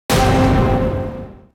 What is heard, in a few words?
activity win; Arcade